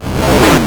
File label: Arcade Riffs